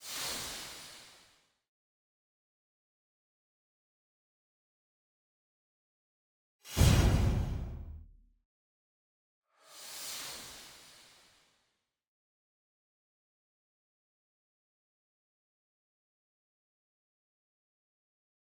SFX; VersesAndFlow; Smollette

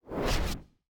SFX; Rickey Smiley For Real; Snapchat

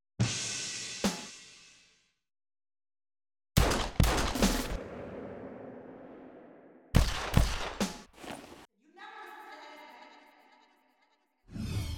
FX Stereo; Clean Cuts

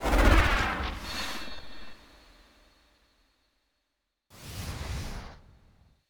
SFX; 2 Guns Inside Look

